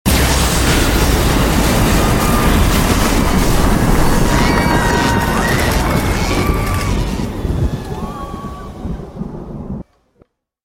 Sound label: shockwave powerful blast